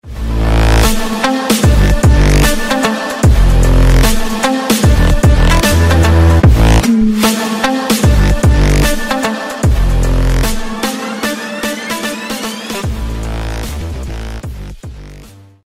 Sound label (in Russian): melodiya outro